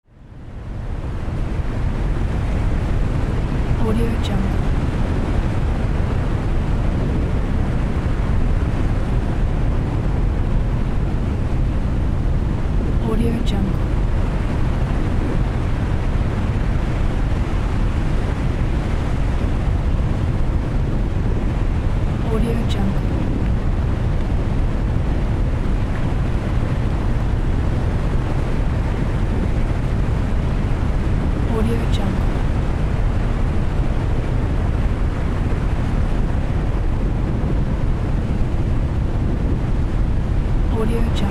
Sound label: cruise ship ambience; mattpear